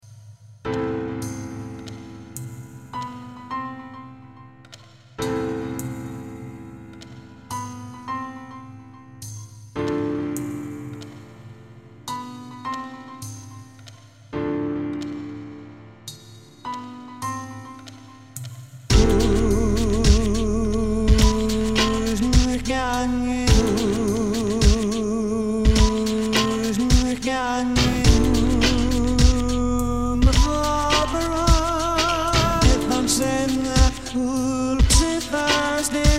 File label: beautiful piano